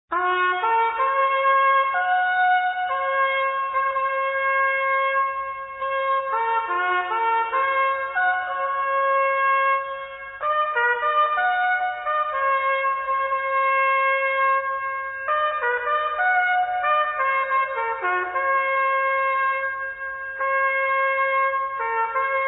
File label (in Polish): hejnal